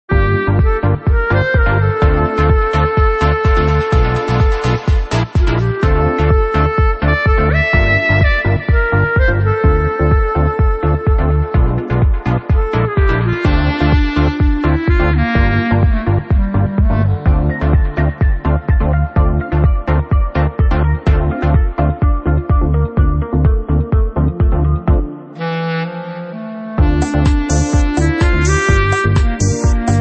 Clarinet Lounge

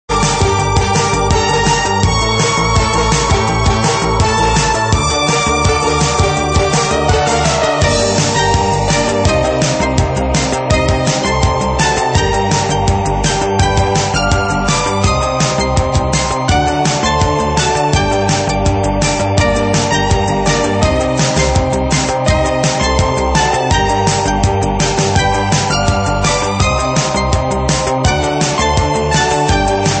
Synthwave mix; Warhawk

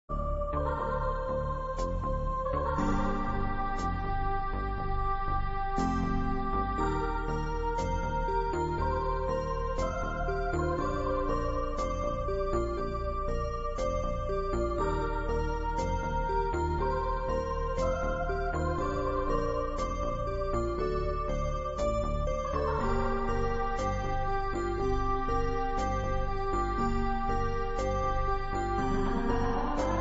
Melody Mix

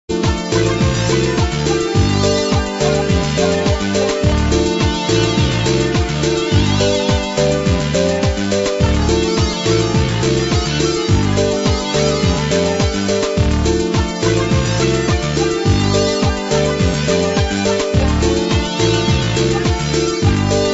FUNK; Paper Boy

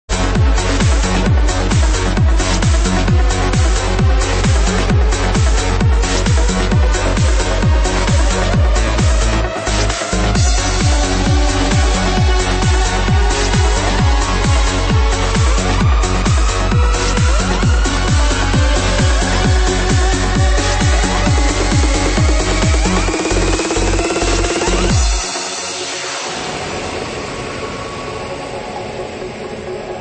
trance; Legend of Kage; ninja

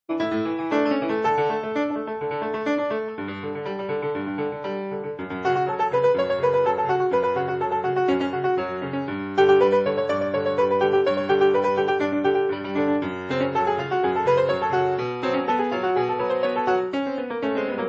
grand piano; Archon I + II

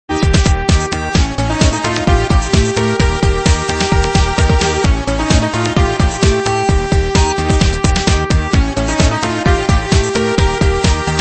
Disco; Short Circuit 4 (Dischord Disco)

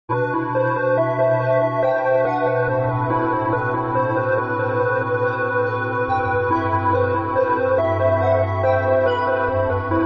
Electronic Requiem; Blood Valley

